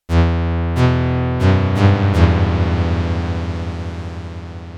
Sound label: 1985 JX-8P muddy release